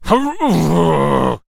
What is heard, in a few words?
Miner Death Vocals